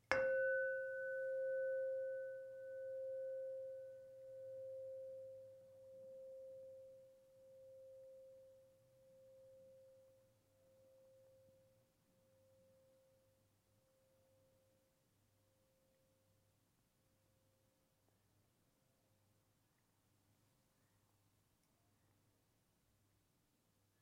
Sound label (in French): gong; un silence